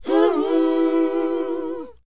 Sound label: WHOA D, Vocal R&B & Dance; ILIO; Vocal Planet